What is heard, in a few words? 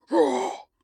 Attack